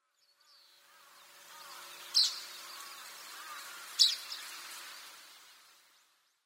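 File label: Richard's pipit, sakata; mamijirotahibari; koe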